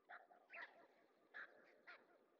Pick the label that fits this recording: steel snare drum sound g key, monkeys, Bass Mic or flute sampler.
monkeys